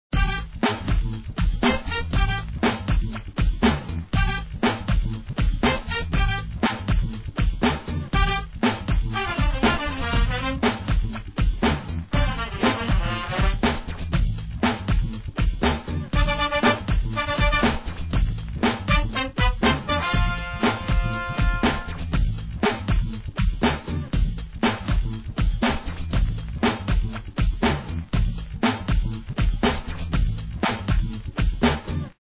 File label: loop; screamin upstreamin